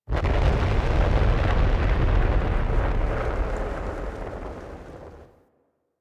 Gas City Distant Cloud Clap Explosions; Ambience; Warframe; Corpus Gas City

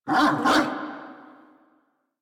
level up bark, Kubrow; Enemies; Warframe; Tame

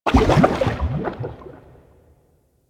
Coolant Gurgle; ambience; props; one offs; Warframe; Venus Open World; Venus Landscape

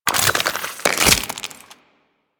Reload, Weapons; Warframe; Infested

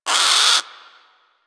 Sea Bird Tranq Dart Grunt; Warframe; Hunting Critters; Ostron Sea Bird

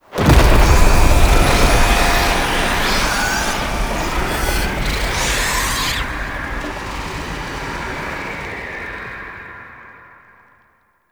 Hub Alarm Stinger; Warframe; Quests; Entrati Labs

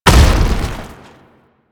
Tank Infested Step Default; Enemies; Movement; Warframe; Lasrian

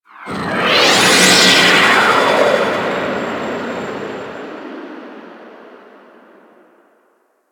Sector Wars Dist Liset Fly By; Warframe; Tenno

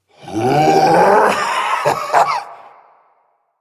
Ability Lift Laugh Lich; Warframe; Kuva Lich

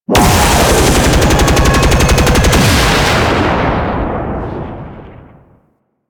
Weapon Fire; Enemies; Abilities; Warframe; Teralyst; Rainalyst

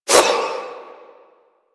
Proj Throw; Army Replicant; Warframe; Hoplite

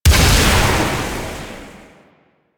Projectile Death Large; Weapons; Warframe; Duviri; Incarnon Adapters

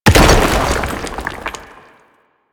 Destructible Rock Cover; Objects

